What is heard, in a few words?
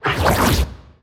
bighorn prep spit